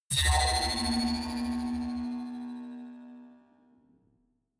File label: energy field vanish; energie